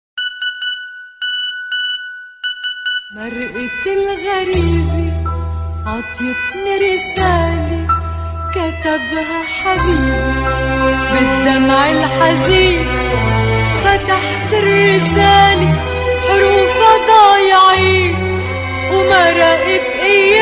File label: arabic message ringtone